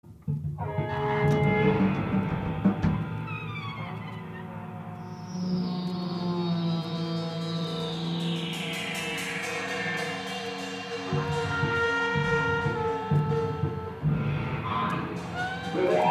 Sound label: cool belgium awesome tune ringtone